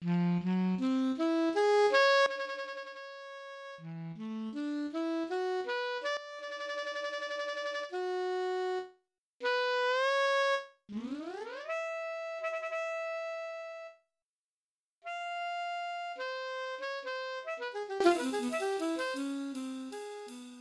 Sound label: MIDI